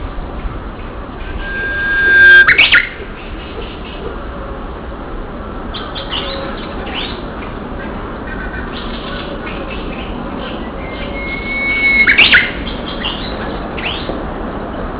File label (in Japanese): uguisu; KitamotoShizenKouen